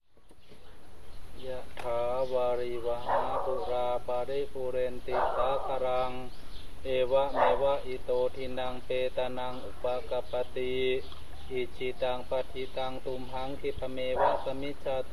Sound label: binderbaht